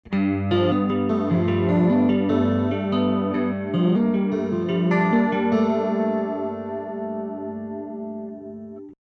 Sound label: Clean